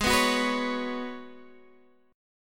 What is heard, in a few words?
Db 7th Suspended 4th Ab, guitar